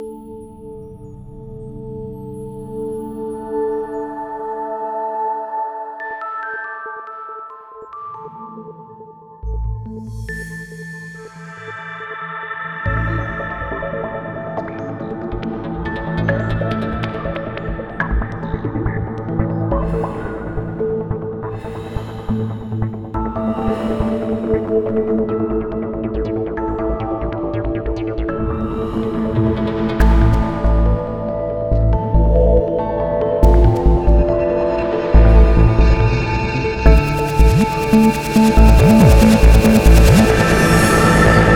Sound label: Suspense modern fast-paced subtle